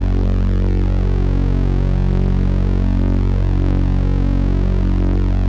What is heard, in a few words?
505, String Machines